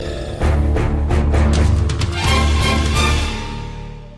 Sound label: game over; metal gear